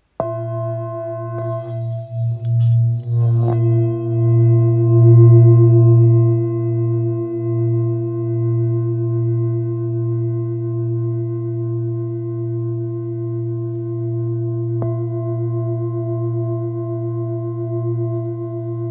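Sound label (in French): La dièse, bols chantants tibétains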